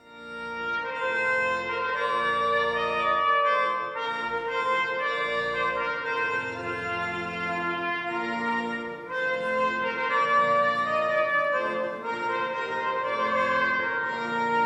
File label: trumpet